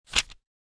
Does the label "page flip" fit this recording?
Yes